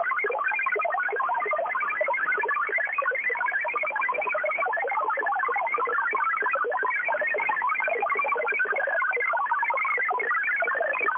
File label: Saab Grintek Communications "MHF 50" robust HF waveform MFSK-33 / 54.3 Bd; Saab Grintek MHF 50 robust MFSK waveform at 54.3 Bd with continuous transmission